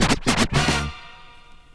SCRATCHES

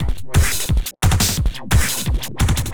Glitched Beat 175BPM; glitch kit

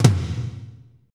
TOM, Roland; Northstar - Drumscapes Roland

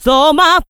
SO MY VOX, Funky Vocals, SHOUTS; USB Soundscan vol.18

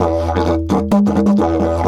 E 130, Didgeridoo

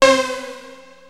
Access Virus B; Synthworx

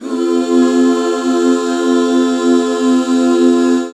F 6, back chords; Sound & Vision - Gigapack II CD 1

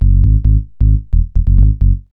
B-LOOP

